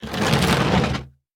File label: Wood Box Drag Short; Sound Effects Bible